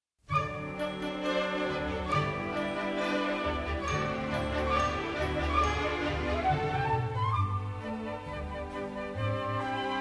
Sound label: penny whistle song; Three flutes are featured. This merry song speaks - and sings - for itself!